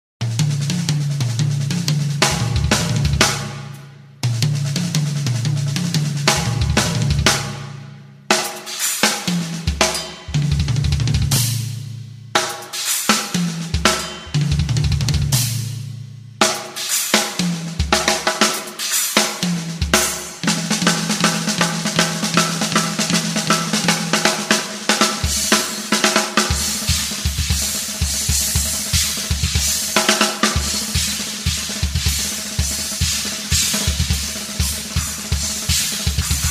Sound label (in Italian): assolo